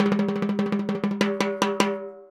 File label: Timbali, Latin Percussion